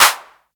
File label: clap sound f key; percussion; single hits one shots